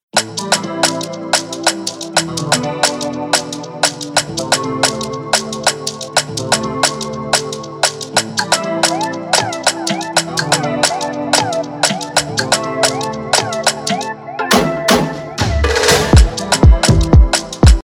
Afro-swing intro, Afro-swing Type Beat Instrumental